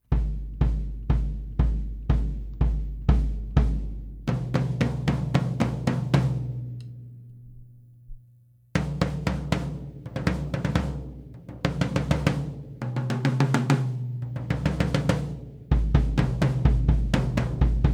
Radio King WMP 22 18 13, Slingerland

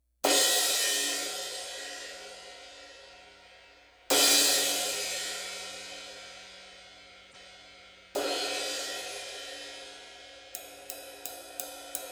Zildjian 22 A Proto

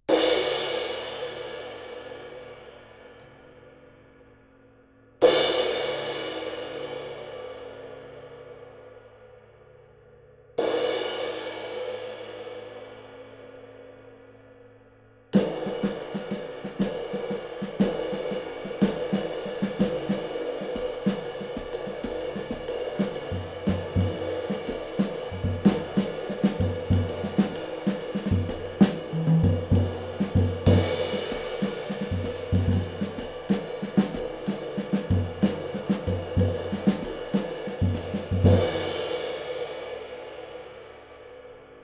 20 K Istanbul

